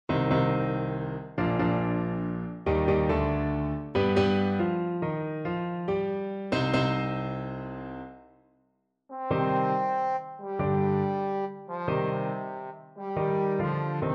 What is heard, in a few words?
trombone